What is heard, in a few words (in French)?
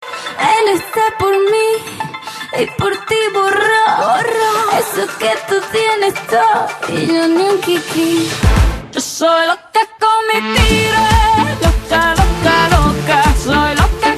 SONNERIE